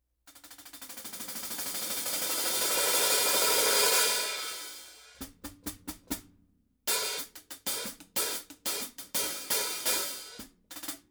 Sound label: 14 Sabian Artisan Proto